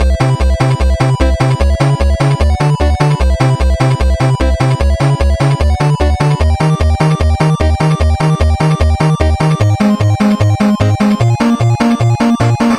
8 Bit Game Invencibility Loop

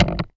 Chair

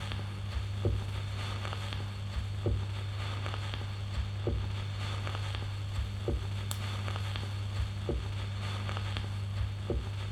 Vinyl Ending

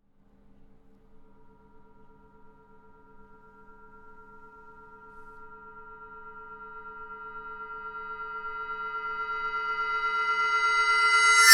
Bell Reverse

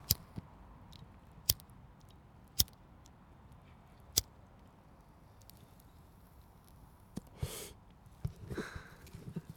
Lighting Up A Cigarette; Zoom h2n xy

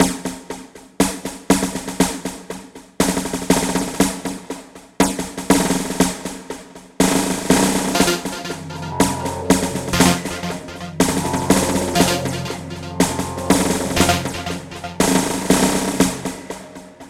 Military March Intro

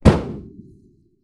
field artillery gun; Inmotionaudio; Freesamplesunday

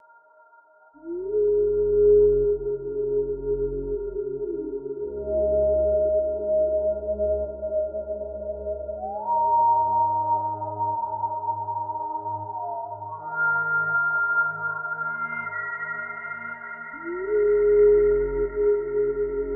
Owl

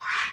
Piece Slide

